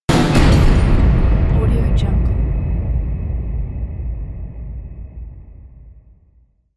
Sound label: shot on